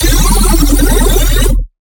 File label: Bass Hits, Dubstep; Scattered; Advent Calendar 2017 Day 21 - DubstepBass